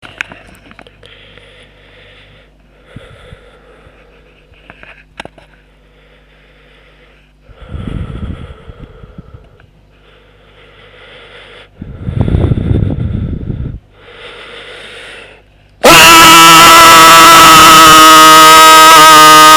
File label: ahhh